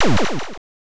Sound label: Coin; combat school arcade